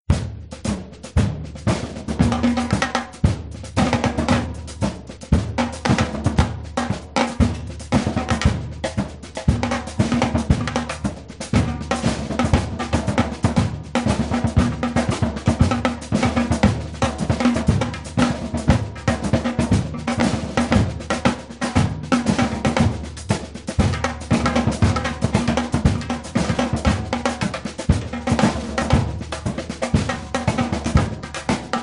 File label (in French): Fausse Batucada Batterie; Montricoux; Montricoux au jour le jour